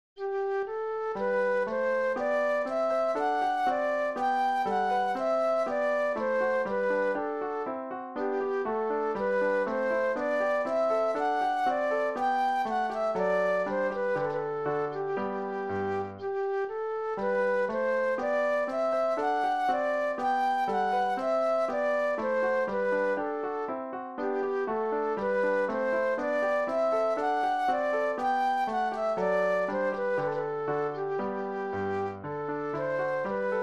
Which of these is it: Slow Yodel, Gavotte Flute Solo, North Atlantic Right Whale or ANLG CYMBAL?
Gavotte Flute Solo